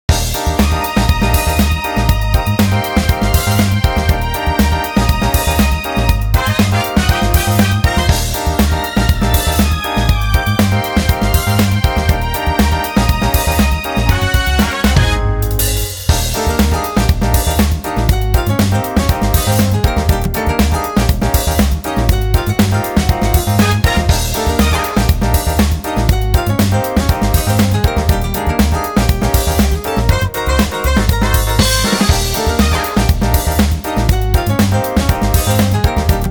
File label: summer wind beach, bgm